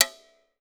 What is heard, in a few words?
RECO RECO, Percussion; Voice Crystal - Steve Reid Definitive Percussion; BRAZIL SET